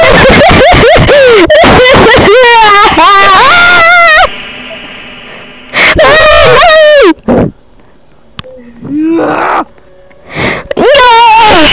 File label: very loud laughing and screaming